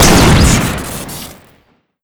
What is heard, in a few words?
hunting gun shoot crit; tf2classic